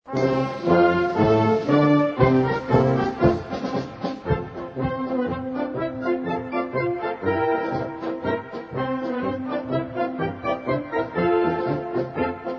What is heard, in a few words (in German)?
Grafensteiner Marsch